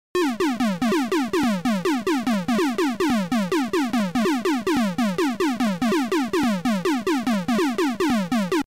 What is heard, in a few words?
square tomtom